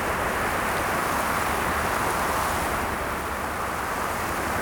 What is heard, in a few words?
Wind Trees, ambient; Totaled; XBOX